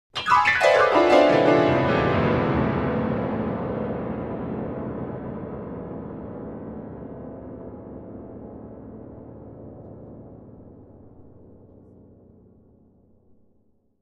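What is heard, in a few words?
Grand Piano Descending Chords; keyboards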